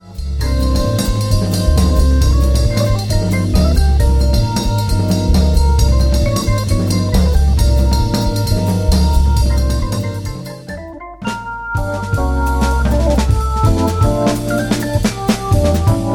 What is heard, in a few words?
Jazz